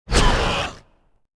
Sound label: monster kill tap